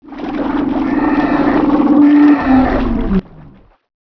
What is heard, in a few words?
roar; dinomovie